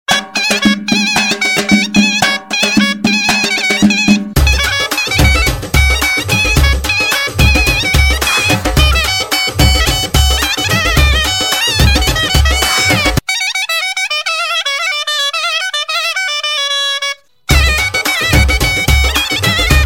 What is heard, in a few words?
armenian zurna